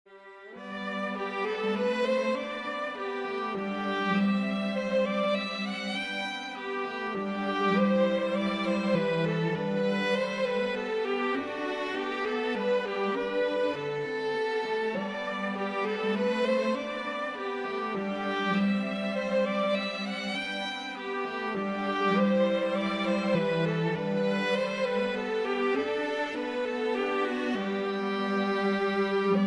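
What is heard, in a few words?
Minuet in G Major String Trio; J S Bach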